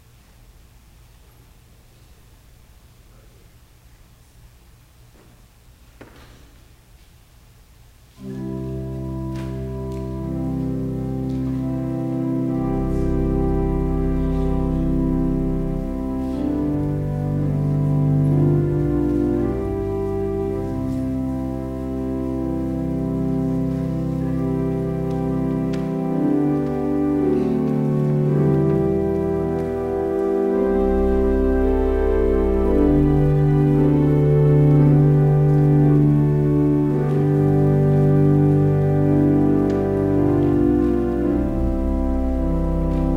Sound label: Organ Music; Swearing an Oath